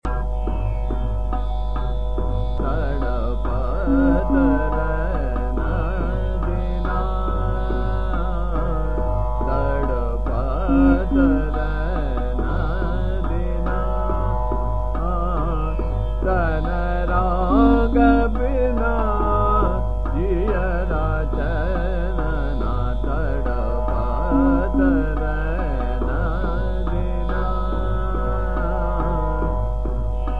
Chota Khyal Trital; Maru Bihag; Tarpat Rain Dina

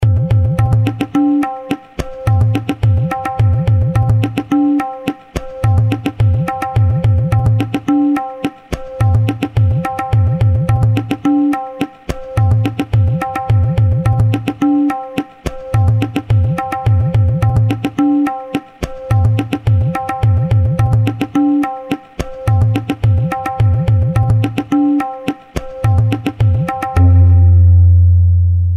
ektal drut, tala

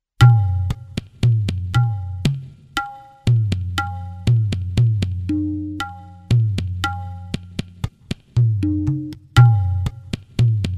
ganesa, tala

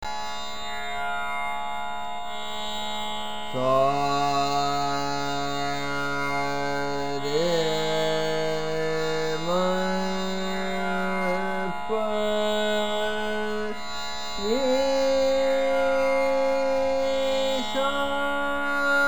Des Aaroh Avroh

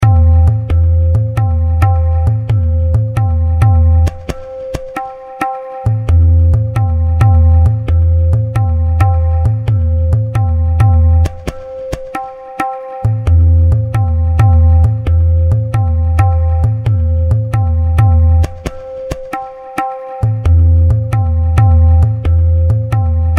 sitarkhani, tala

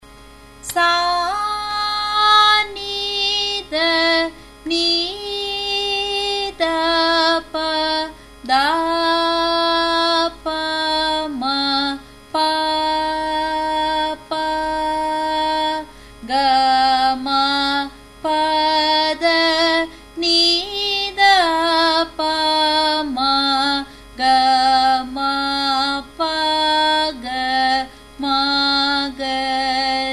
Sarali Varise G sharp; Sarali 11; Carnatic Music Basics

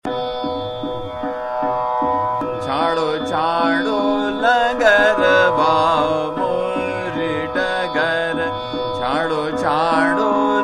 Chota Khyal, Trital; Bhatiyar; Chando Chando Langarwa

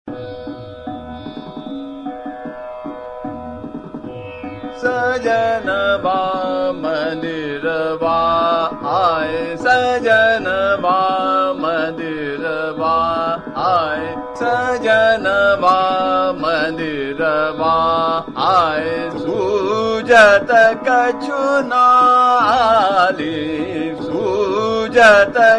Chota Khyal - Ektal Drut, Charukeshi; Sajanwa Mandirwa Aaye

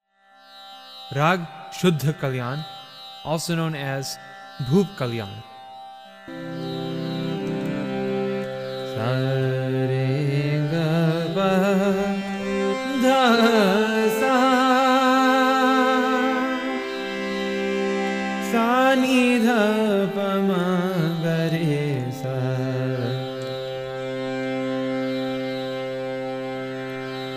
suddha kalyana, raga

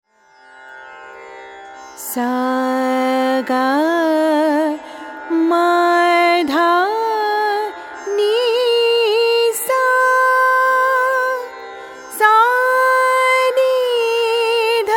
malkauns, raga

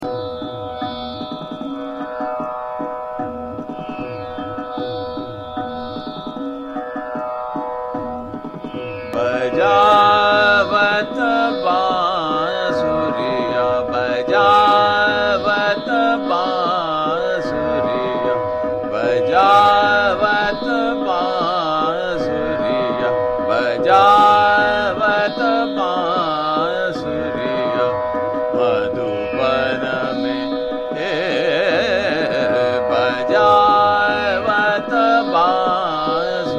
Chota Khyal - Ektaal Drut, Bhatiyar; Bajadai Bansuriya Madhuban Me

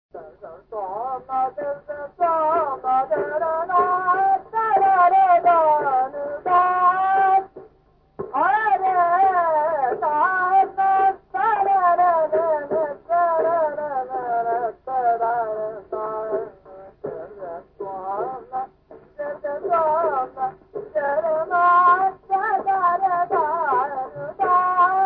jaunpuri, indian vocal; 1905; abdulkarim khan

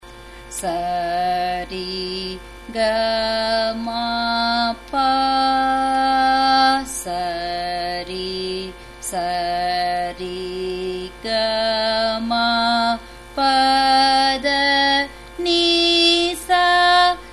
Sarali Varise; Sarali 05; Carnatic Music Basics

